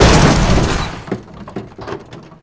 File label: smash car impact bridge; Parking Boom